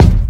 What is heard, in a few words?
bumpin bass drum d sharp key; kick drum